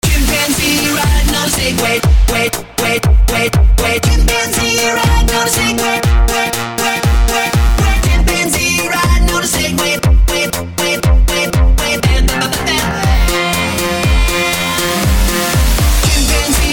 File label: chimpanzee dubstep